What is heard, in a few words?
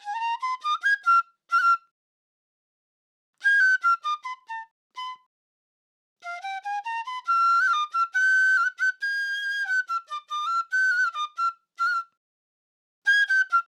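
Piccolo Flute G major 140 BPM, Dancehall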